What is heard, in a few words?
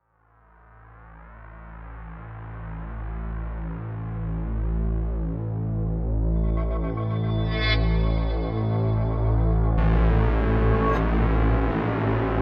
electro space; archibald editions